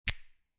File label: High five Sound